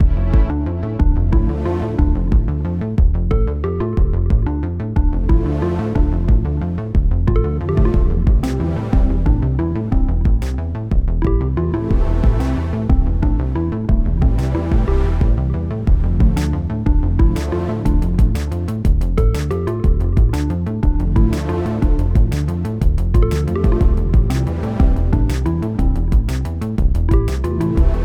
Dungeon; Eversoul; Android

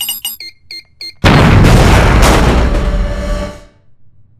Explosion; Secret Files 3; Android

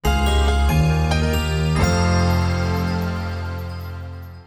Logo Jingle; Touhou Danmaku Maze; Ice Fall Creative Logo Jingle

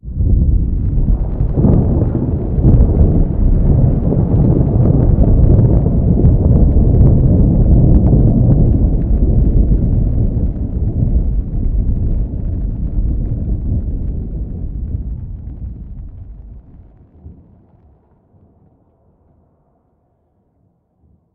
Earthquake Large; Going Under

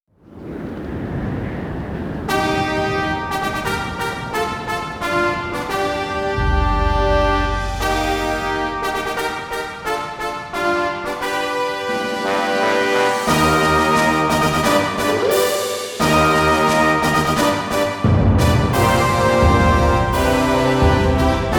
Fanfare Cairo

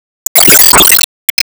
Enemy Bigclam Armup; disney star smash android ios mobile gamerip 2020

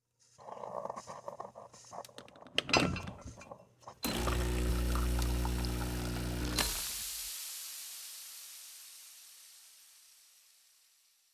Espresso Machine Full; Caffeind Founder

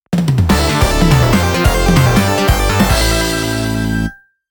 Awakening Call

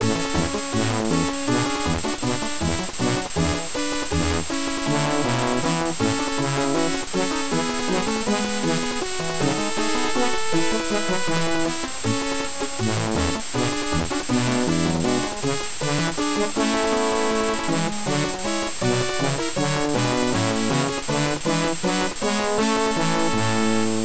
Team Jingle

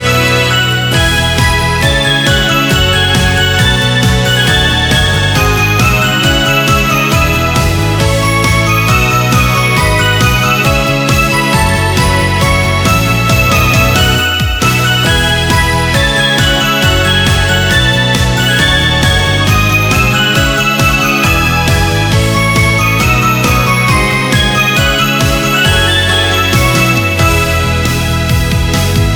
BGM Reach

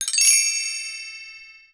UI SceneClear DistanceBonus

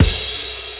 Crash

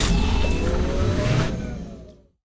Speedpad; subway surfers city sound effects; Android, iOS

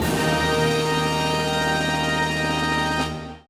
win sting; Ben 10 Protector of Earth; Wii

